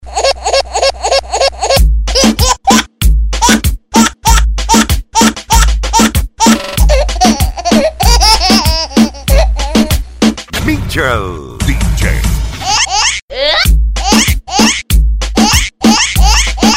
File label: funny baby laugh ringtone